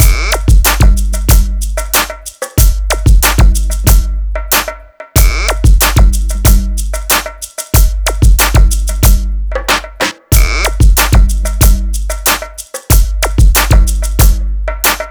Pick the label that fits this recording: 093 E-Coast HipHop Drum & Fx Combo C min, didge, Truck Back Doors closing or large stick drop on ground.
093 E-Coast HipHop Drum & Fx Combo C min